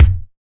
round kick drum sample F sharp key, bass drum tuned to the F# note; kick drum samples